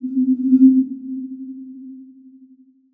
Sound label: Transition Digital Scanner